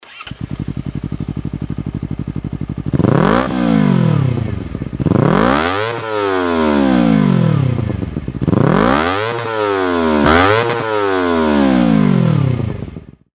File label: cbr250rr